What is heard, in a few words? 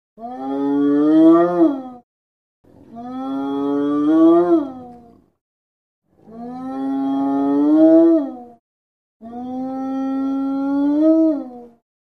Hyena Spotted Moans